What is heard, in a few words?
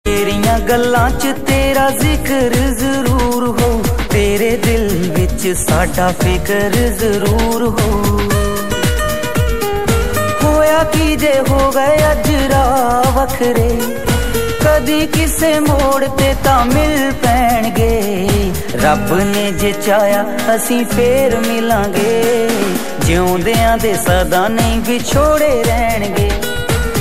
Soft Punjabi